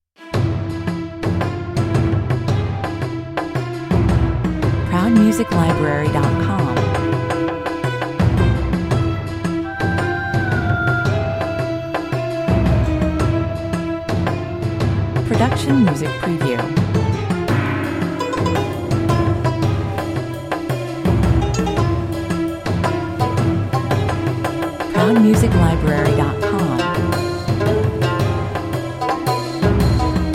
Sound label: 112 bpm, Ethnic flute, female voice, percussion, sitar, oud, strings and Burmese gongs